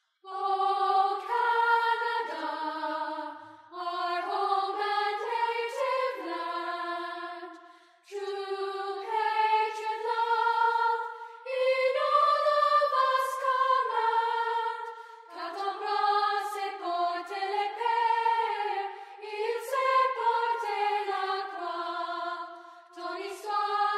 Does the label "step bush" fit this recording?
No